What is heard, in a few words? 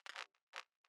zap